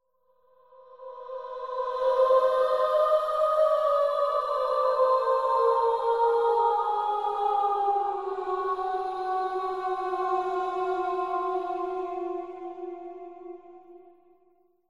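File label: horror tune ringtone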